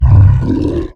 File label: MONSTER Growl Short; Infernal Radiation